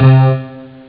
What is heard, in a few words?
Stabs